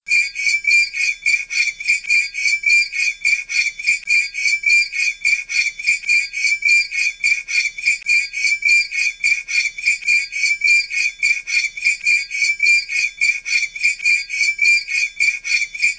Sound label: fork glass